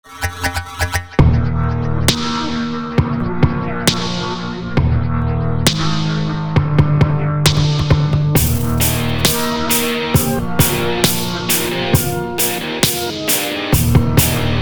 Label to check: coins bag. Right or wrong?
Wrong